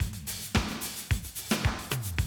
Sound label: 110, Classic Drum Loops